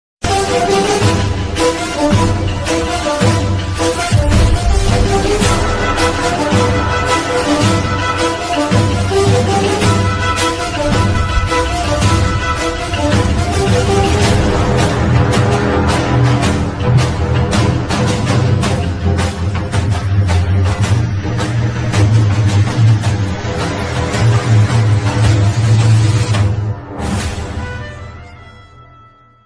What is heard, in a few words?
Bgm Music; Ringtone; Asuran